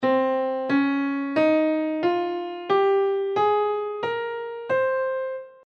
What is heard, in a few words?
phrygian mode